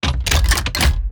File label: case purchase key; ui